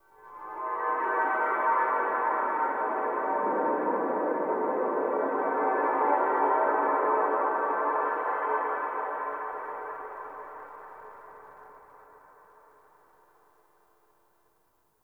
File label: Church Bell; Dagath; Warframe; Ambience; Clan Dojo; Dojo Misc